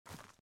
run grass

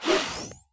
puzzle lamp open

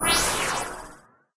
Ufo Fly Away; candy crush saga gamerip

